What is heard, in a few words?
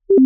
mute Mic